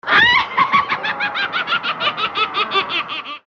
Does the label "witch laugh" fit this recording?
Yes